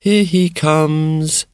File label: here he comes male SUNG